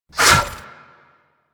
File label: Disarm Dart Shot; Warframe; Warframes; Pacifist